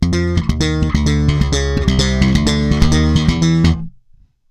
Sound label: FILL, Bass, 130 BPM; Sampleheads; Ultra Freakin' Bass